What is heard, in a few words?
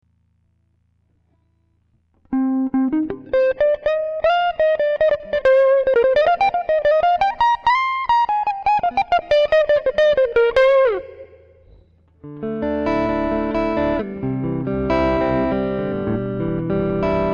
YJM 308